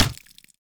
tower royal archers skill rapacious hunter impact

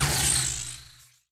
Spell Tower Hold Dissipate

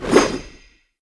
shadow dance hit; hero; mirage

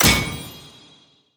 ui hero tower select

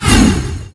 range shoot; veznan

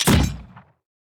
heroes basic Attack cast; broden

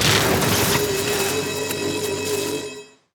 tower eldrictch Channelers basic Attack cast

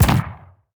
heroes basic Attack impact; onagro

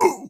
enemy darksteel anvil death; sfx

